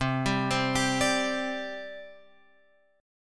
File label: C Suspended 2nd, C, guitar chord; Csus2 Chord {8 10 10 X 8 10} arpeggiated